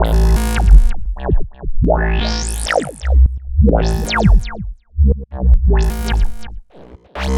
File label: Synth Ultra Bass; Sonic Foundry (Sony Creative Software); Modular Electronica; Basses